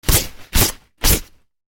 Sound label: fabric wipes; soundscrate